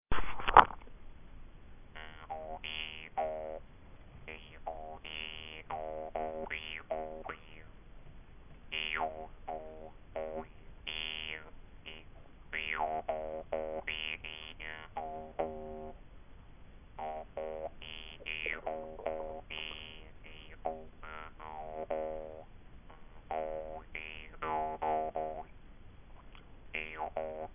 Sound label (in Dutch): mondharp, muziek instrumenten